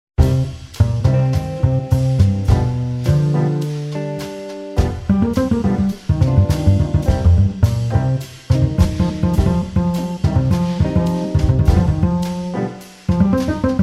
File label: JAZZ CLUB STORY